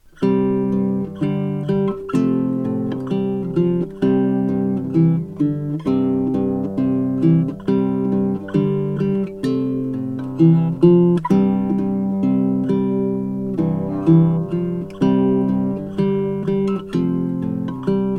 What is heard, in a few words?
blowing, ukulele; pono mb